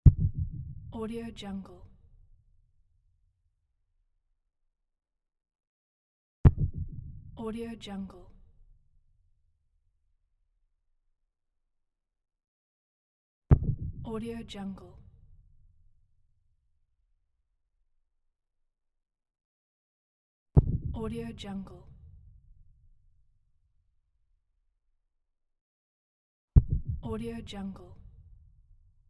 low synth boom impact wobble

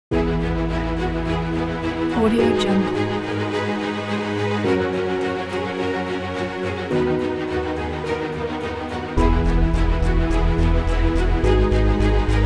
epic cinematic inspiring

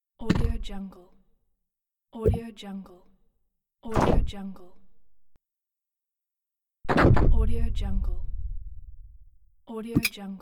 percussive fx

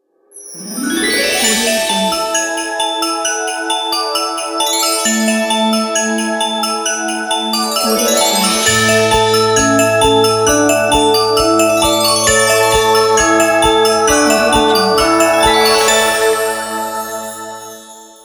this christmas logo

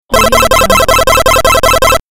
scoring points retro tally

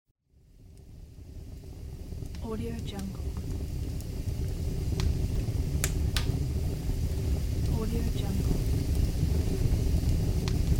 fireplace

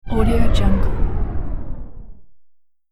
the dark descent

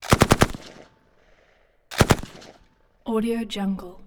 gun pkm combo; pov

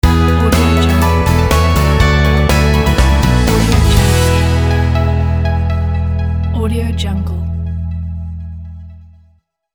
bright uplifting intro logo

